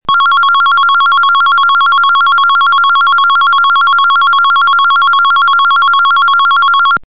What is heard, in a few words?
Warble; signals